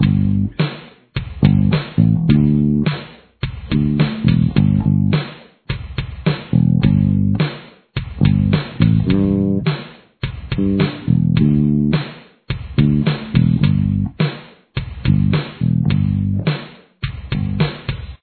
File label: bass